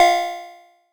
lead bonk F; leads

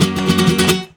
GUIT BREAKS; Best Service; World Colours

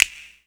SNAP; jazz jackrabbit 2; M8

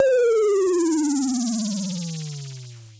FX, Dual Percussion Synthesiser; Klone

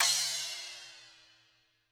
crash, cymbals; Monke; RoBKTA Sample Pack Supreme

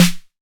808 SD Tape Sat, Snare Drum; Goldbaby Tape808 & Tape909

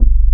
KNOCK BASS

